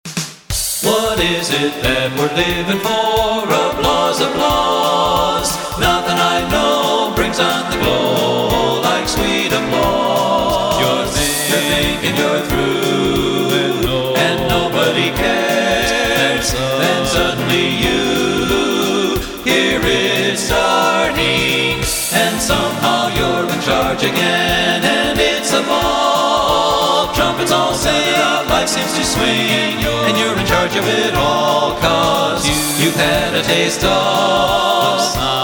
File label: Applause Applause; men